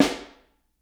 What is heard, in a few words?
SN3 Overhead, snare; Ableton Foundation FreePacks; Snare Designer